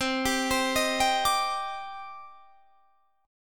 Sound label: C Minor Add 9th C; arpeggiated; madd9:1,b3,(5),9; JGuitar